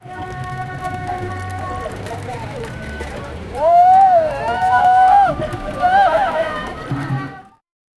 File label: nadaswaram playing in pro